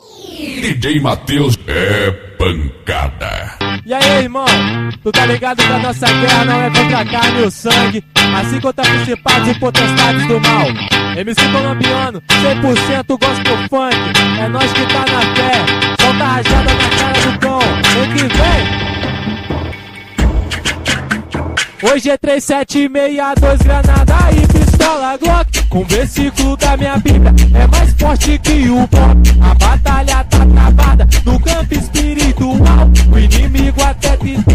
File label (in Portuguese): funk gospel